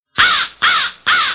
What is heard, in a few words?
CROW SOUND